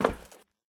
walk proflist; Escape from Tarkov

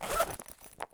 pouch use; Escape from Tarkov

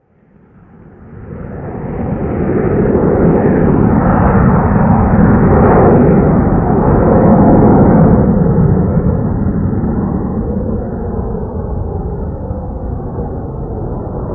jet flyover; Call of Duty Modern Warfare

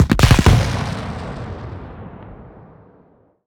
objects, World of Tanks

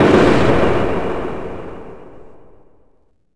turret mgun end echo

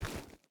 footstep rubber boots gravel walk; Rust

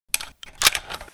Inf Weapons Foley; Red Orchestra Ostfront 41-45

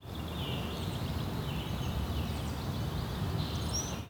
bird loop part

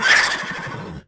Horse Die Vox; Civ4 warlords

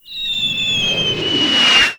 mortar incoming; Explosions

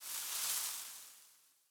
leaf rustle grassy hard

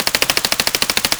vss loop distant; Escape from Tarkov

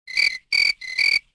crickets; Civ4 warlords

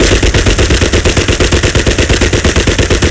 Browning 303 LH Outer; Weapons; DCS

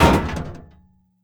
door impact metal; Rust

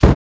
fire start; Rust

